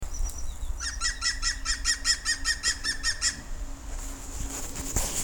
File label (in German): grünspecht